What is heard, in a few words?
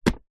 foley single wellington boot drop; zapsplat